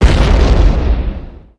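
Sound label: explosion medium; Tanks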